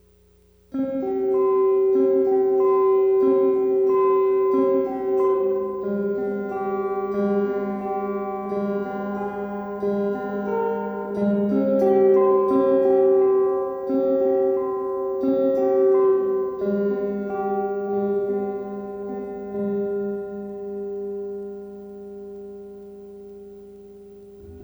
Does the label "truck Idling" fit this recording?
No